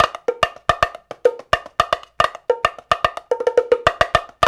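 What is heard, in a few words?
108 bpm, bongo tonga; Spectrasonics; Supreme Beats; African Contemporary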